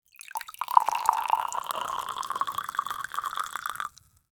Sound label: wine being poured into a